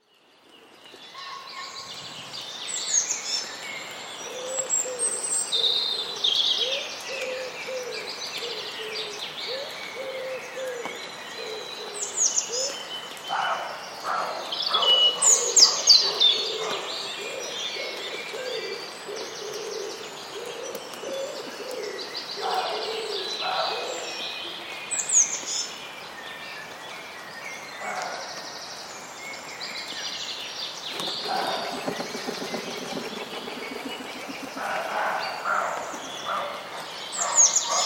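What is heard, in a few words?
roe deers and birds singing at dawn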